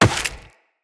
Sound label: Step Rock, Footsteps, Walk; Characters; Tenno